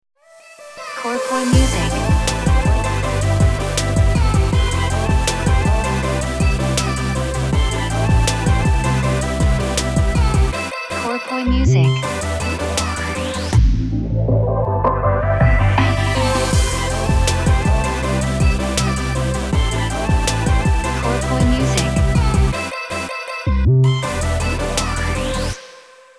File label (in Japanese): jingle ウォーター